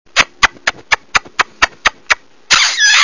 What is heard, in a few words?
kisses